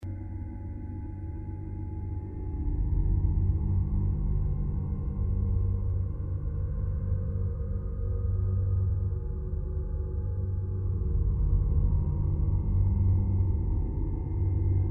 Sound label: hum inner navigation depth